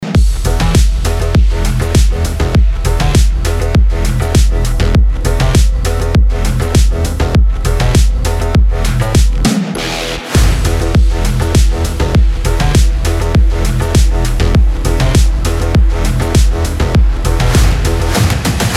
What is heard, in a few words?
bgmusic